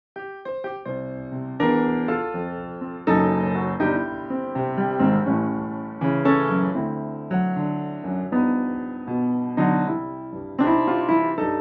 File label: U4 Weathered; pianoteq8